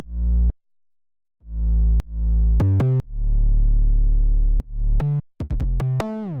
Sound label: Bass